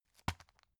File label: tissue package drop soft